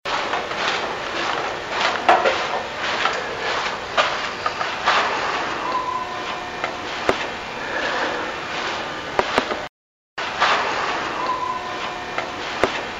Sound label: Scream; Carlson Hall